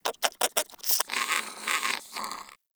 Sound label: Rodent Vocal Tranquillized; Warframe; Hunting Critters; Characters